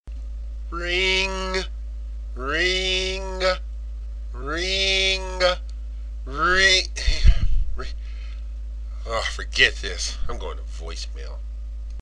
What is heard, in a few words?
Voice Mail Ring